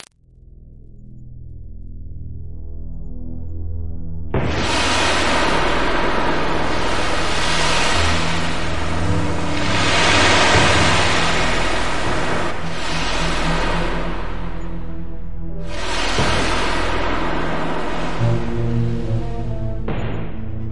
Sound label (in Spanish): volcan